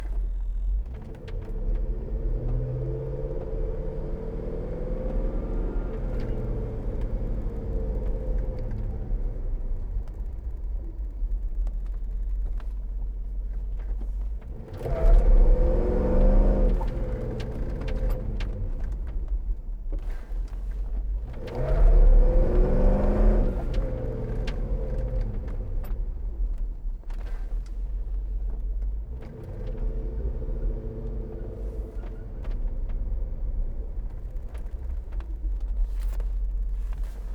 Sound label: Jeep Grand Cherokee WJ 4.7 engine reverse interior mono; V8 Magnaflow; SoundHolder